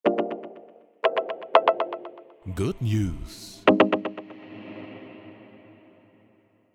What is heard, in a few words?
Jingle Good News